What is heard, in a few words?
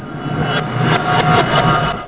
plat go; amphetamine